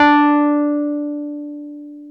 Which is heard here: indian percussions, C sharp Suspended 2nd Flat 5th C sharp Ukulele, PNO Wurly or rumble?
PNO Wurly